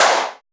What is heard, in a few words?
BURST CLAP; Drums In Your FACE!; CLAPS